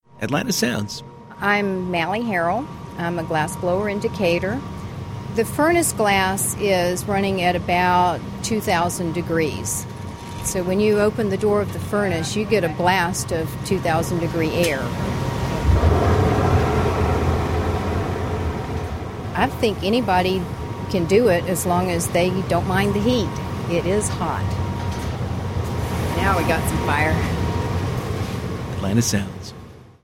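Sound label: Glass Blower, atlanta sounds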